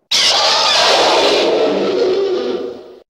King Ghidorah Roar; Heisei

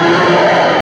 Giant Bear roar

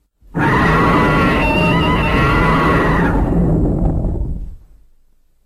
Final Form Roar; Destoroyah

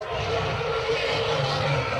LARGE ANIMAL GROWL; Sound Ideas

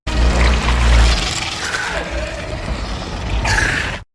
Endoswarmer roar

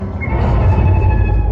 roar; Tiamat

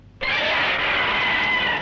Roar; Tsunojiras; Godman

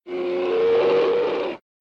Lion; LatitudeZero

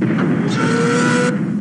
Roar; Medical Jet Jaguar

